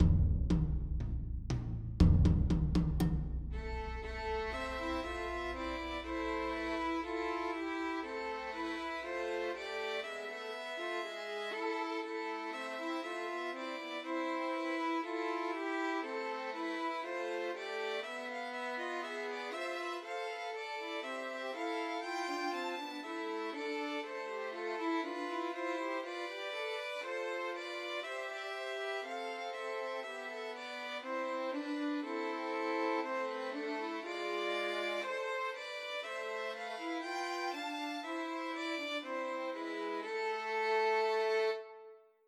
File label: Trios for Three Violins; God Rest Ye Merry Gentlemen; Christmas